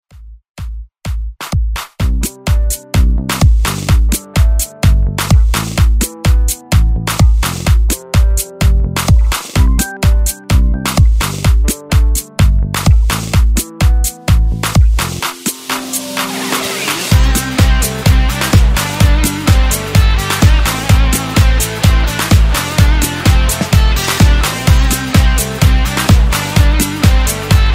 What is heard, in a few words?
Dance Redrum